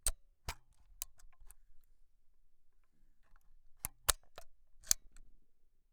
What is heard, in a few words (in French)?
OUVERTURE FERMETURE CAPOT